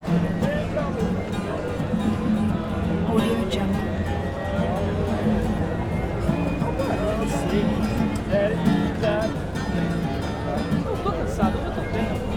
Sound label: street musician harvard square cambridge ma